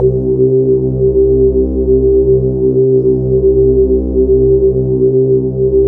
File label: BASS, OBI DRONES; Zero-G Ambient 1